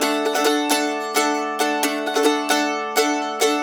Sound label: 132 RIFF, Blues Guitar